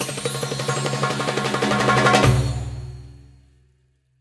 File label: near Win Main Game; wild bazaar